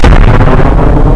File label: boom; XCOM